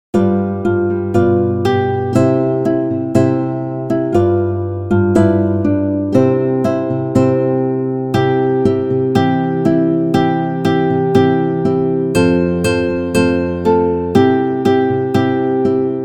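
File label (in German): Töff töff töff die Eisenbahn, Gitarre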